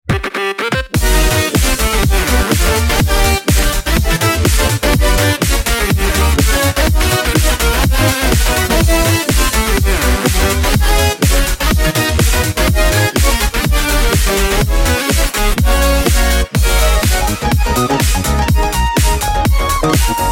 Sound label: Sendoff (for Jazz Ensemble)